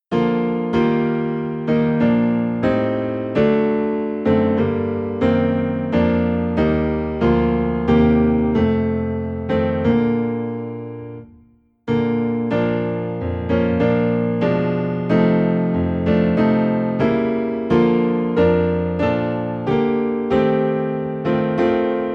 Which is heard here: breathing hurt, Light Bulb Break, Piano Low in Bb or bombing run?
Piano Low in Bb